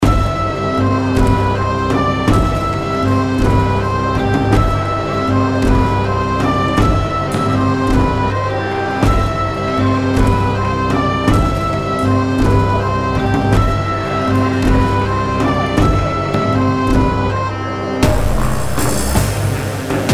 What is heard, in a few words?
Future Medievil Bar Music; Krater